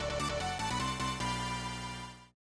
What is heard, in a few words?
level start; session-start; bomberman